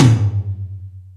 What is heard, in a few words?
Sluggo Drums, Drum Machines